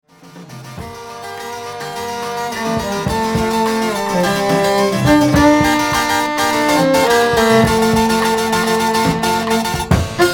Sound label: baroque jazz trio